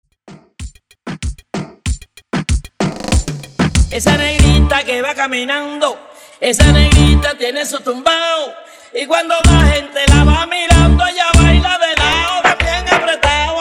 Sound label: Intro Outro 95 Bpm